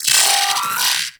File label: Arachnoid Micro Vocal Death; Warframe